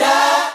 Vocal Oneshots; Vengeance Samples; Vocals

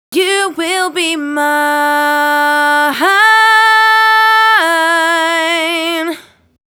Chorus Alt End, 150BPM; Vengeance Samples

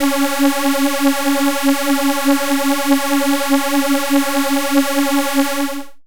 Synths C#; Vengeance Samples

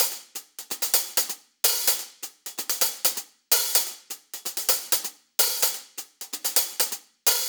Real Hihats, 128BPM; Vengeance Samples; VEH2 Loops

